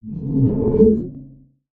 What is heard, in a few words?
Fly By Gong